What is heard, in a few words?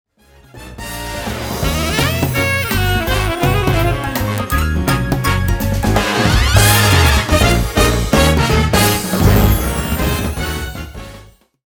Hollywood Style Jingle